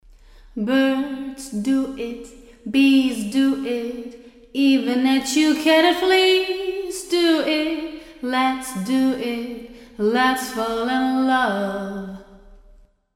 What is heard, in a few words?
Vocal Large Room